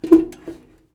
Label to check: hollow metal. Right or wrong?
Right